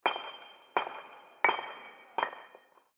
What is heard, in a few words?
blows to gold